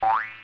Zipper

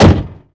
door close; The Sum of All Fears

